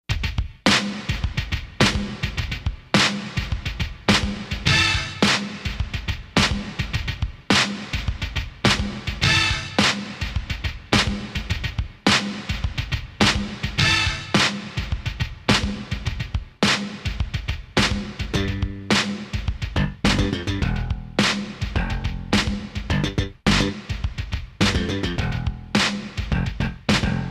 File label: tripping